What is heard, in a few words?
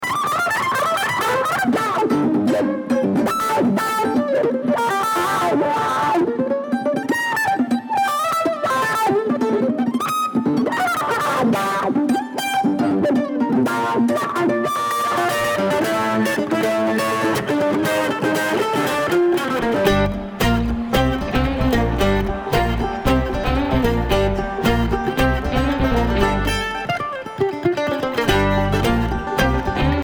Kentucky Mandolin